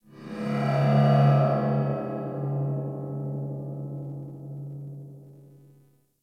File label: Galleon Low Ship Rand Amb; Warframe; Ambience; Grineer Galleon; Galleon Misc